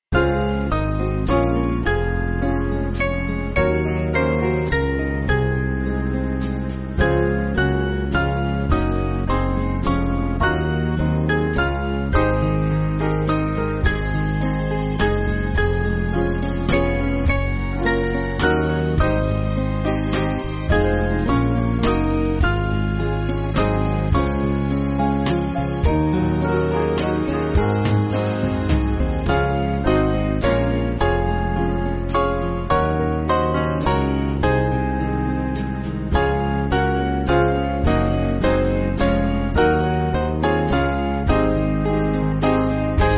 Band

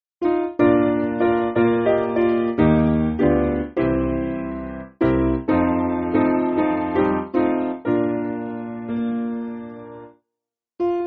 His Grace Aboundeth Refrain Piano; Kirkpatrich

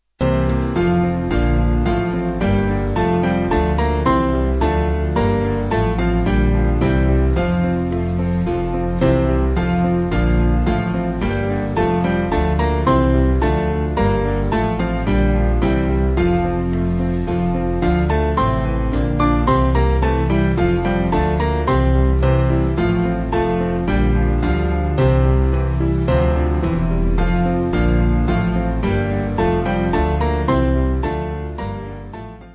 O Little Town, Band; Small Band; Forest Green

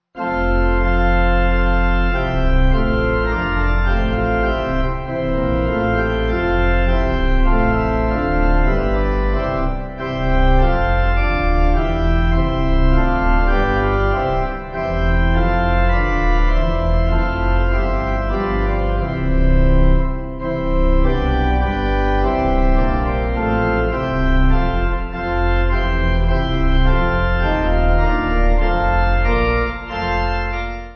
Pipe, Pipe Organ

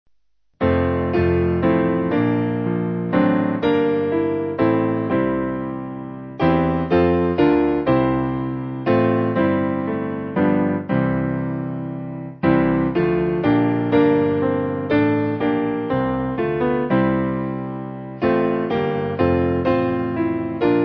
Abends Piano; Around The Throne

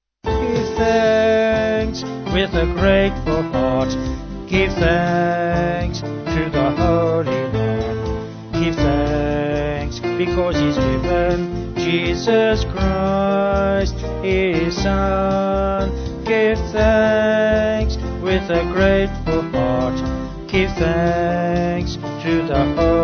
Vocals Band

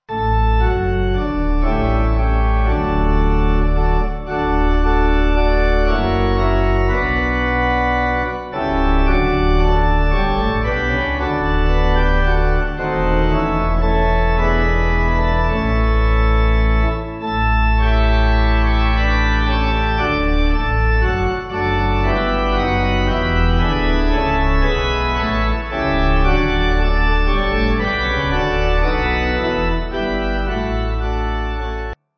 Pipe, Pipe Organ

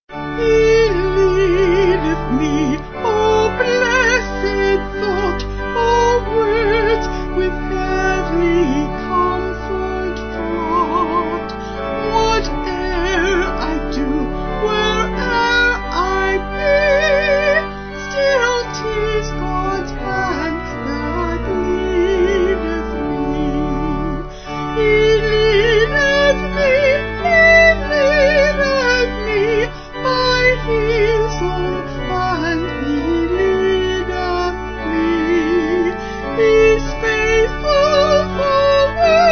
Vocal Organ

